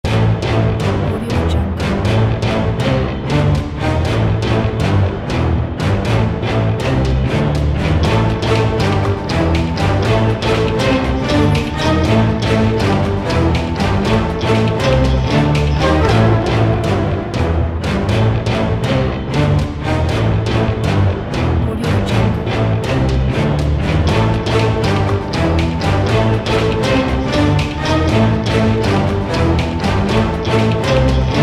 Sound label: spy ready opener